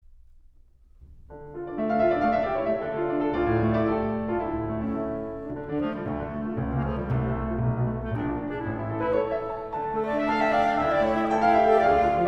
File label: Clarinet Trio Allegro